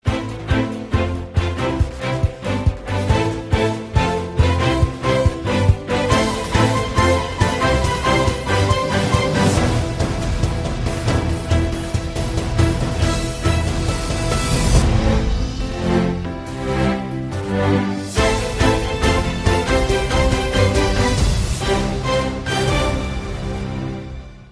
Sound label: NEWS INTRO